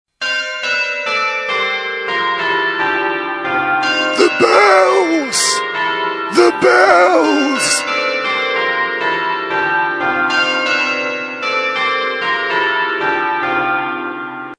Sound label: The bells ringtone